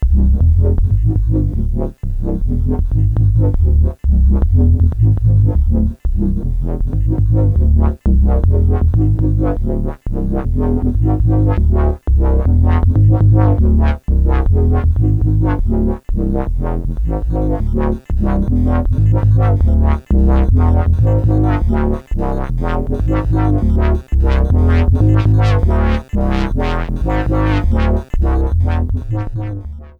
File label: RINGTONE